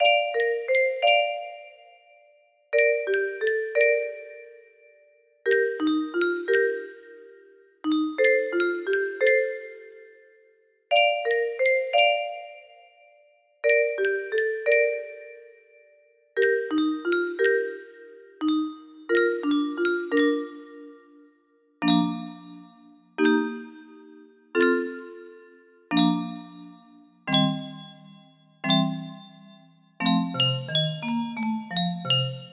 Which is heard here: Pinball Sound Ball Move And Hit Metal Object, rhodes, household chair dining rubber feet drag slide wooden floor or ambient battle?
rhodes